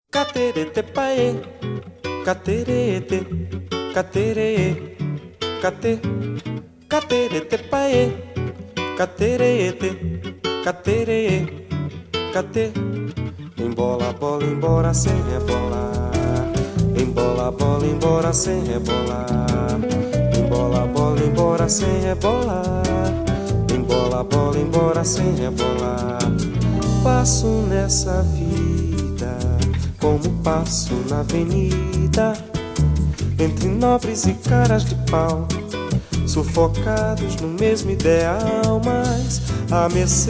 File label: Brazilian Cafe